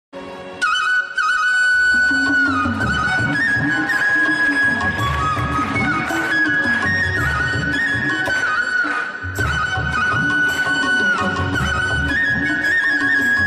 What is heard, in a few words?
Flute Ringtone